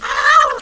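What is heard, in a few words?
cat attack, cat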